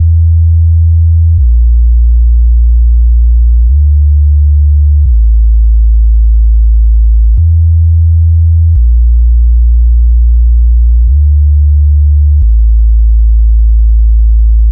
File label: bass, Loops